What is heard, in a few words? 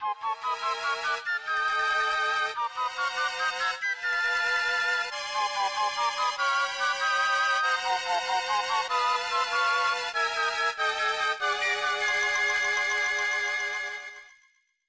Break, Chiptune; Goof Troop